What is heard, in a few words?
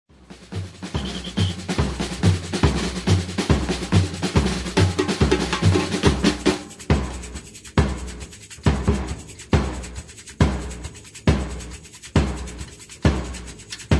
Experiment, סמבה אקספרימנטלית 2 / מעבר בין כלים; Batucada